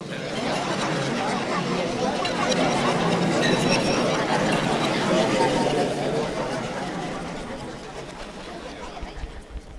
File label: Flutter Room; SFX; Ambient; Electronic Point Blank